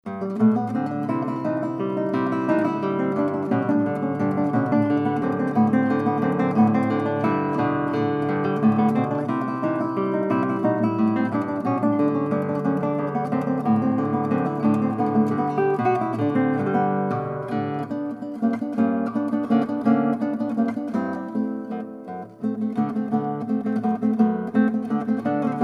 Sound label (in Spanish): Trompetas Jalisco